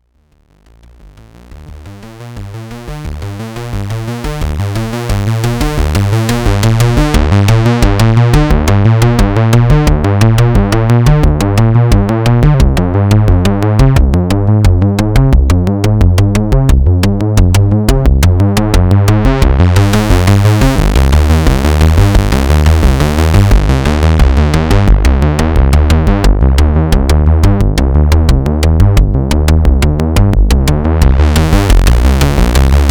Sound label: pro1 monotribe sync